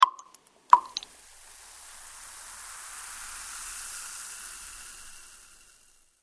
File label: household medicine tablets drop water fizz, Tablets Drop Into Glass Of Water; 2 Tablets / Pills Dropped Into Glass Of Water Followed By Fizzing; Bathroom (Sound Effects:Household); McKinney Sound